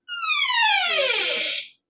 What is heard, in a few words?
Wooden Door Creak